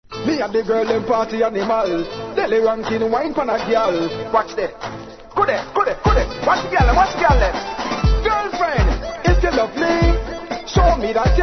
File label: riddim